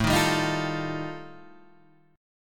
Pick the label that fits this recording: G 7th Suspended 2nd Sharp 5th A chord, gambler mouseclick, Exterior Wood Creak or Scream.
G 7th Suspended 2nd Sharp 5th A chord